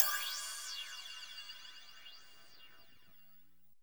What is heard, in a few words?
odd ride; Drums In Your FACE!